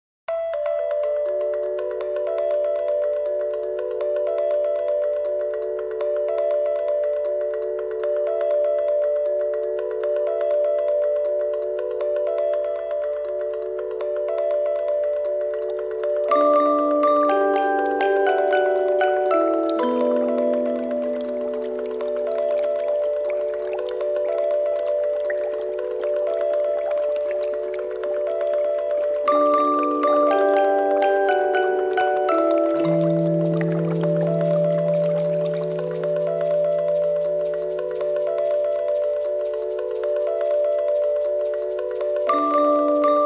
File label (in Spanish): psicocumbia